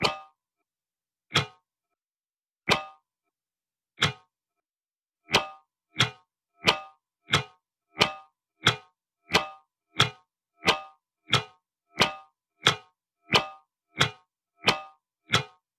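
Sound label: Timekeeper Quickening Clock (90)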